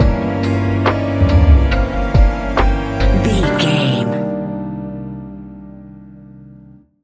chilling crypt you stinger, A relaxed and chilling piece of music; A relaxed and chilling piece of music, great for light documentaries, background music for tv shows, lift